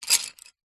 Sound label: office pencils handful set down concrete